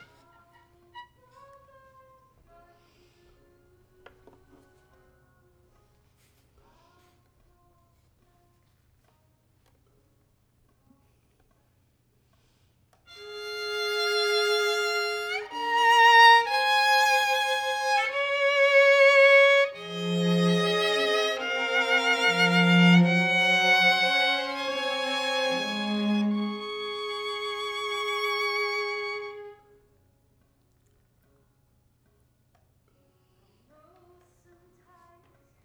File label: violin